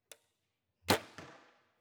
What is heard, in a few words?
archery foley release and impact